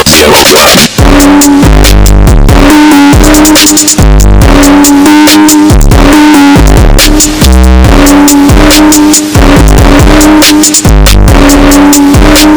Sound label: loud phonk